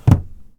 Drawers close; Phasmophobia

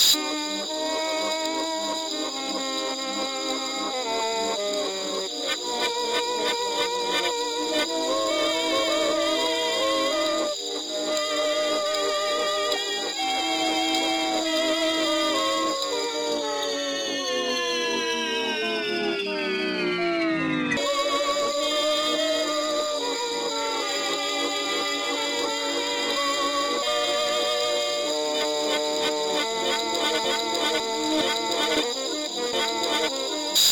Creepy radio music; Phasmophobia

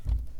Underneath stairs footsteps; Phasmophobia

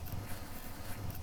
Drawers loop; Phasmophobia

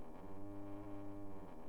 Cabinet Loop; Phasmophobia

